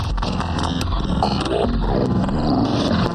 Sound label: music computer glitch; game